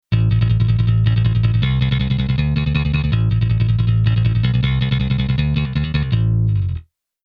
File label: Bass Arr Metal Bass